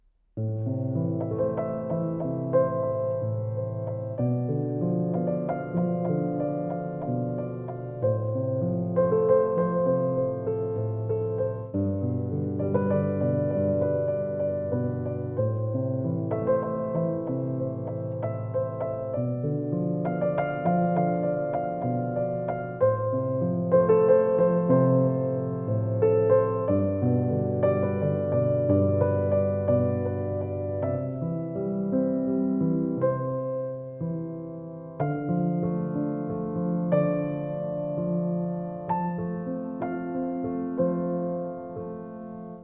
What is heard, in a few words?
Bushtit, Piano Preludes